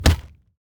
default punch

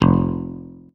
noteblock bass guitar; VoxeLibre; REDSTONE; mesecons

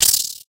spider hurt; Minetest; VoxeLibre; mobs mc

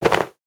armor equip leather; Minetest; VoxeLibre

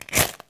fire flint and steel; Minetest; VoxeLibre

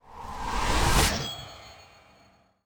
enchanting enchant; Minetest; VoxeLibre

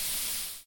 dripping water lava drip; mcl dripping

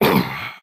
zombie hurt; mobs; Minetest; VoxeLibre

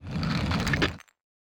shulker close, chests; mcl chests

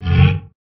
zombie pig hurt; mobs; mobs mc

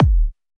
noteblock bass drum; VoxeLibre; REDSTONE; mesecons

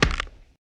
criticals hit; Minetest; VoxeLibre

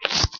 slime nodes step; Minetest; VoxeLibre; mcl core

